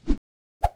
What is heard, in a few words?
turn fly in